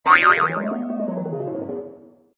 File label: Launch; conservation catapult; project green